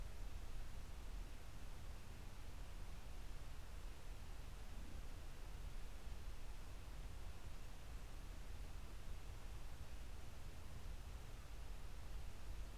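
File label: hydrophone; ICLISTEN HF6092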